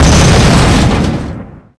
longtom objecthit; firestorm; Gameleap; mw4

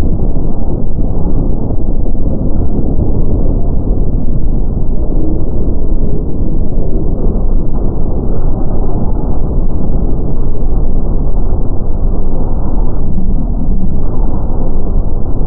Mech Explosion

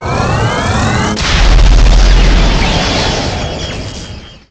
Translocate

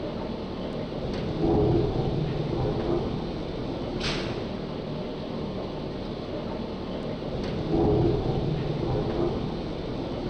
Fuel Tank

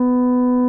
Projectile Loaded

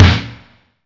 Aux Explosion

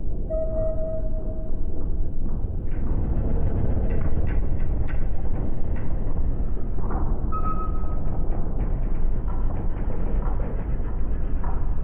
Door Move

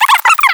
All Warning

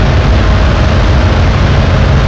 Engine Accel

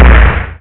Med Explosion

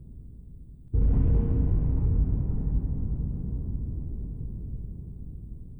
Refinery

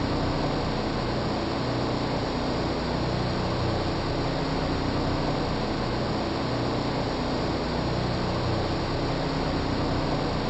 Wellhead Device

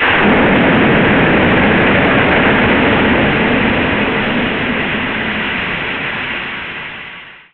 Coolant Dump

